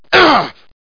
grunt; Doom2

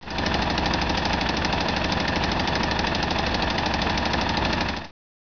sewing

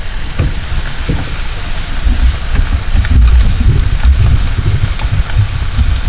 lava; KATANA; Daikatana

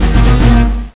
Bluto Stomp

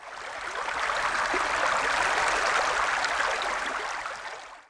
WAVE, water

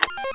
button

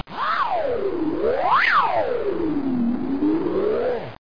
AHHHHH

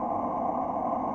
S ENGINE; Titanic Challenge of Discovery Panasonic WIN95

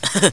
eeggg huhu; World of Amiga; butthead

